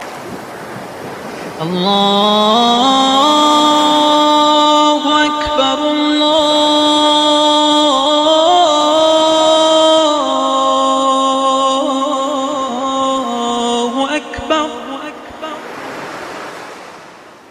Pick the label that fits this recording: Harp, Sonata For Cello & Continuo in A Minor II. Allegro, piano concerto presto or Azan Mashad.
Azan Mashad